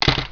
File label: doorknob; ambience